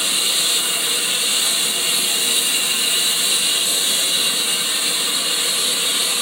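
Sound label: Aircrafts A-10 APU Cockpit; DCS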